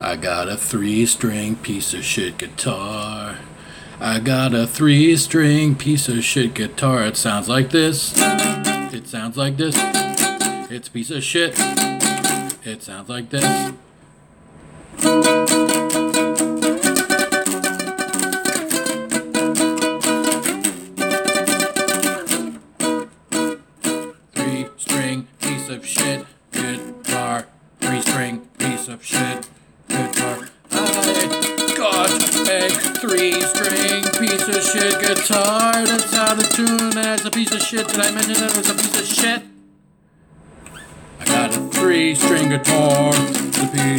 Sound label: Three String Piece Of Shit Guitar